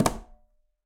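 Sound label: Conga C Flam Mute; Latin Percussion; Drums; Drum Hits; Ableton; Factory Packs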